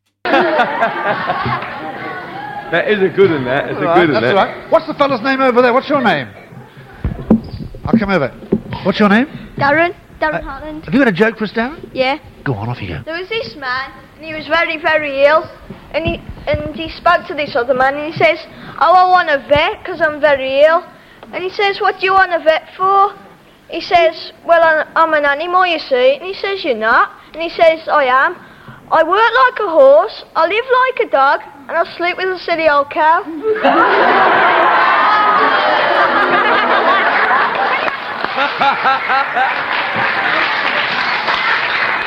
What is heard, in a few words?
laugh; jim davidson